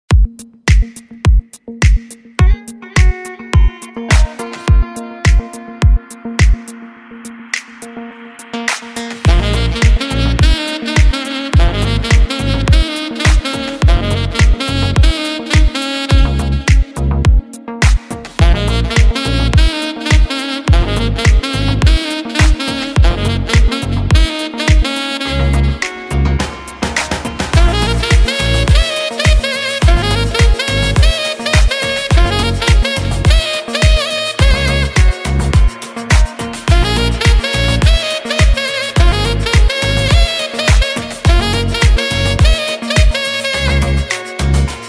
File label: Sax Deep House Session